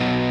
B

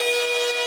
synth main

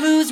vocal

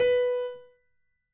piano

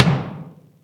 Kick Loud Bass Drop

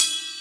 Ride

